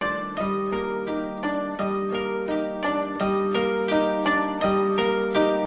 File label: shamisen loop; WORLD LOOPS